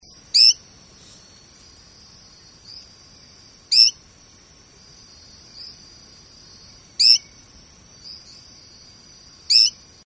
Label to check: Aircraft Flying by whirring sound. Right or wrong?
Wrong